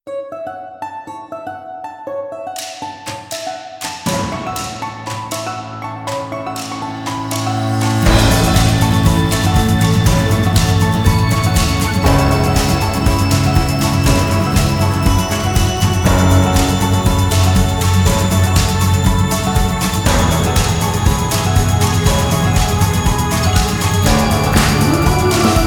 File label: instrumental